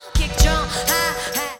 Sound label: Kick drum high hat